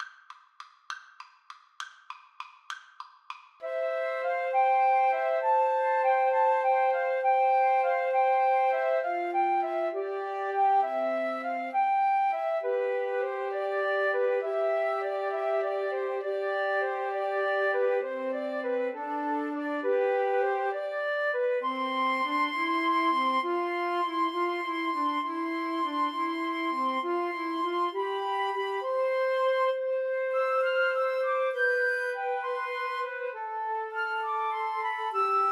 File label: Flute Trio